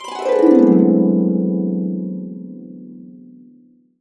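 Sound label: D1, Complete Harps; USB Soundscan vol.62 - Complete Harps; AKAI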